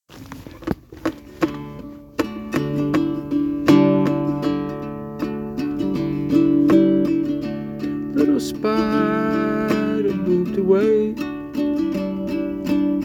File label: little classical guitar